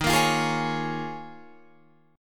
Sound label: Eb 9th Suspended 4th, Guitar, Open F; Eb9sus4; strummed